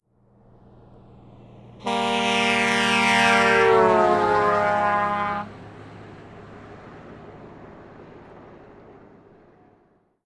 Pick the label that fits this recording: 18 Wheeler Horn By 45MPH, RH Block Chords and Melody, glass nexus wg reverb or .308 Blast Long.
18 Wheeler Horn By 45MPH